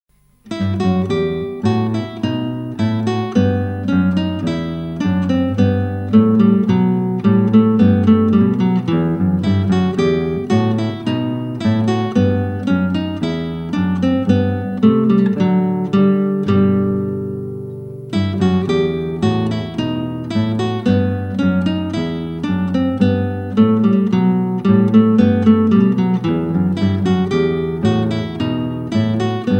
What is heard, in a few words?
bouree